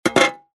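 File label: sounds of shovel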